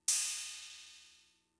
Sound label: Cymbal; Fricke MFB-522